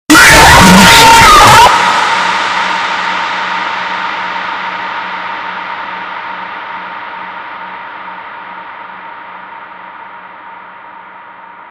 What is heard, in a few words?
jumpscare